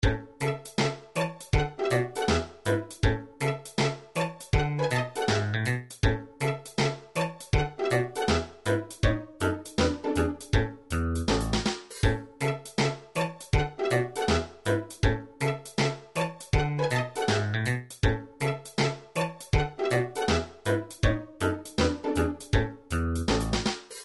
continue; Gameboy Wario Blast

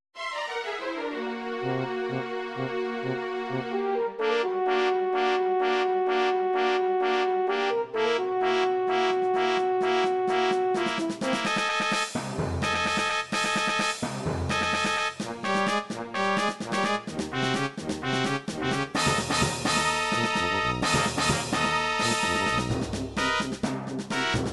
battle orchestrated; nintendo dragon warrior 2

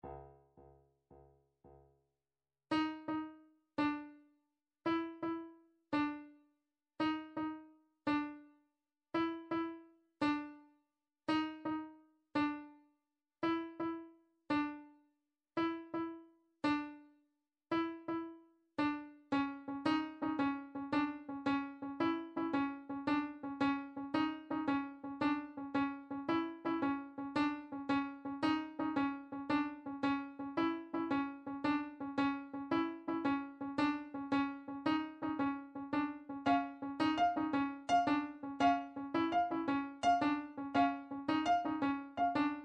drum trax per

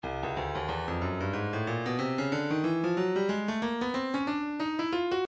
breakbeat midi files 092 093 bpm; Stylus

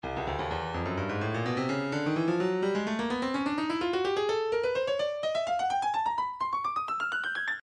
stylus breakbeat midi files 127 bpm; Trixie D

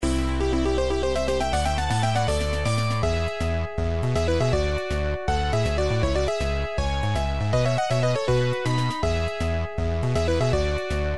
victory pose; gameboy; star ocean

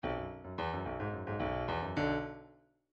bdf midi file grooves funk a funk a

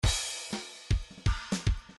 grooves funk; Midi File

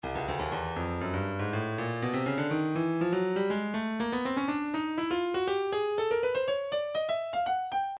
Percussion Bongos, midi; Stylus Percussion Midi Files Bongos 121

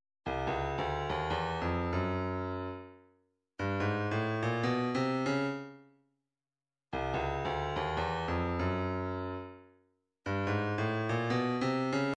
cymbal

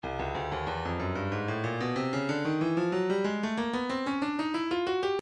breakbeat 093 bpm; float sting c; Stylus Breakbeat Midi Files

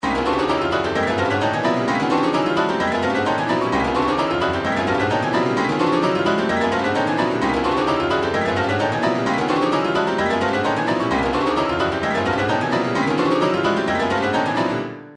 retro funk, midi

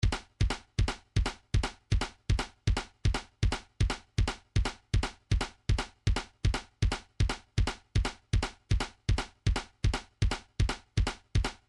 159 native drum, midi; Pacific Rims

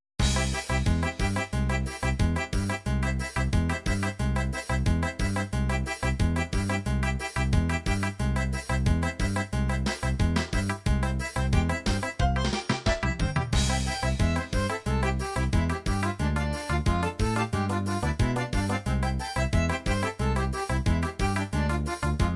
latin xote brasil, midi

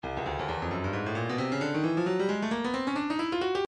stylus breakbeat midi 133 bpm

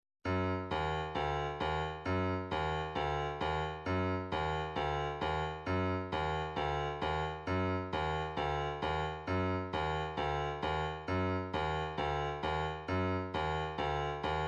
133 hihat, midi

